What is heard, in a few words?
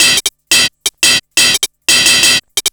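ride, perc loops, 175, drum and bass; EZRollers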